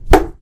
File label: ceiling tile impact bullet, plaster; Source Engine; video game; physics